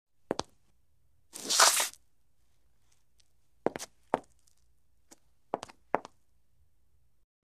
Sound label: Footsteps Concrete